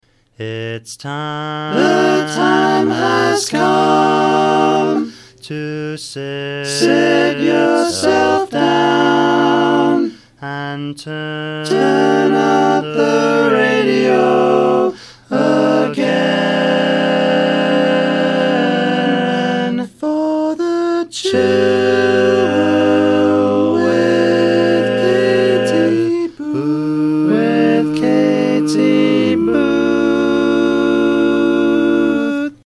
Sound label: barbershop style jingle; The Chill Room